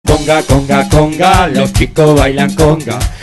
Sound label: conga